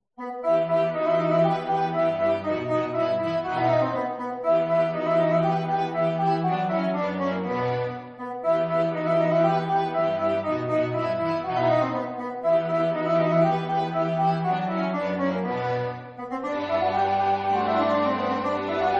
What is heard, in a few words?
Quartet